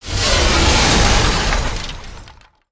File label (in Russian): zvuk okonchaniya gromkogo dela